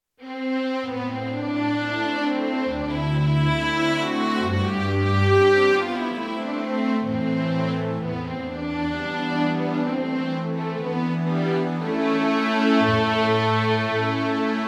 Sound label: Romanian Folk Dances Stick Dance Strings; Bartok